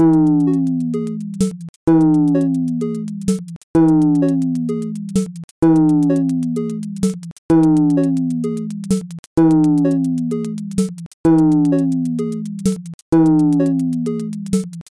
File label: beat kolo